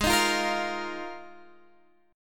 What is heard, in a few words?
D sharp Suspended 2nd Suspended 4th